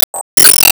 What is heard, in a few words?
Enemy Glassy Common Delete